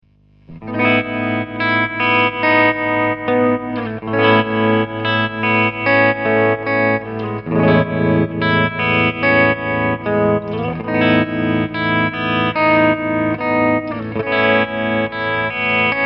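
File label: Tremarella dente-di-sega, optical tremolo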